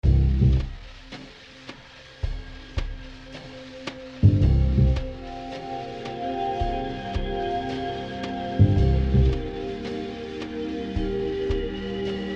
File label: amb Beat Dark; post-mortem soundtrack 2002